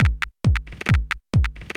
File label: Tech loop